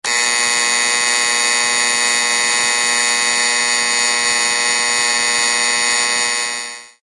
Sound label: Top Buzzer